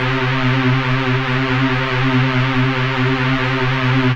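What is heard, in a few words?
Rave Synth, Dance Mega Jungle Rave; 90 s Sample CDs; Best Service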